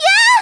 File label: Hit; Zelda getting hit